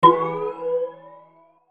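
Hit Mallet Percussion, Hit Mallet Vocal Pad Delay Low; Hit Mallet Flange Ascend; Musical Xylophone Melody; Mallets; Sound Effects:Musical; Blastwave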